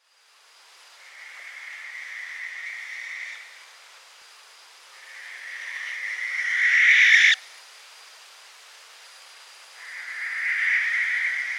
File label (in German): Tyto alba Ruf Schleiereule